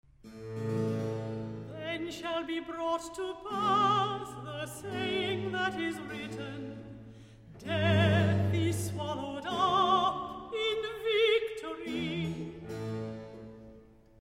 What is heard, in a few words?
Then shall be brought to pass - Alto Recitative; Orchestral; Handel Messiah; Boston Baroque; Part III